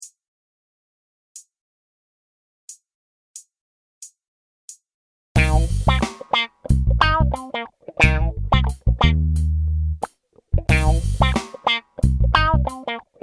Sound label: Improvisation Funk 2 bars 90